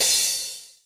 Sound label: cymbal; osu